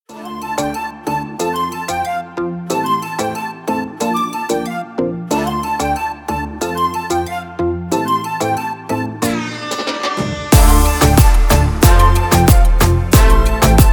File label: tropical house